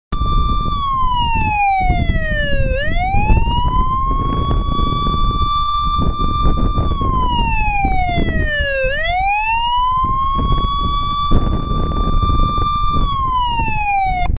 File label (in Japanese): sairen